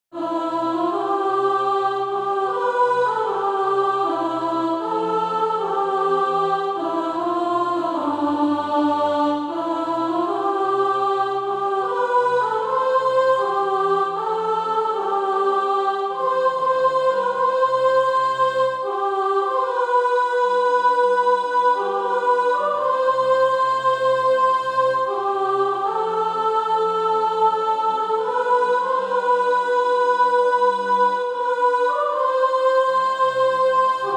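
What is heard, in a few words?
soprano, SATB Practise Music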